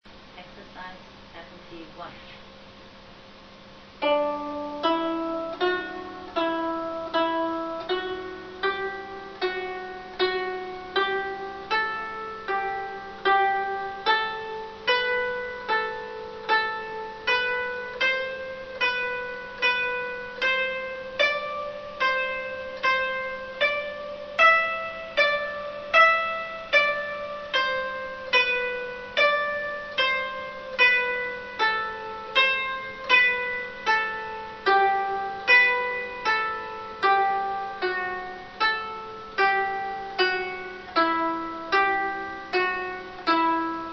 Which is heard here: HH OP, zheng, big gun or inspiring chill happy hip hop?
zheng